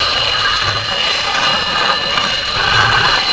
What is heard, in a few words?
slide metal pole, terrains; Tony Hawk's Project 8; XBOX